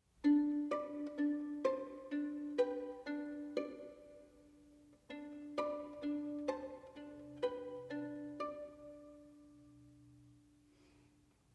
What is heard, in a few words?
second quartet; arching reaching breathless